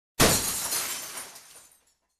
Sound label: Big Glass Pane Shattering